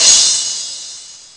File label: hihat; Amiga Soundtracker Sample Packs